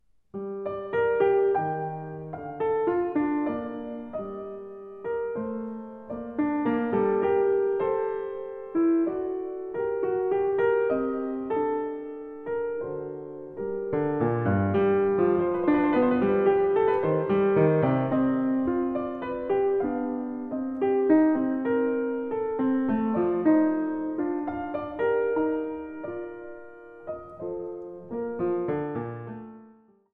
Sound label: Three Part Sinfonia in G Minor; J.S. Bach; BWV797